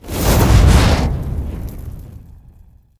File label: Flame Breath; Evolve; Goliath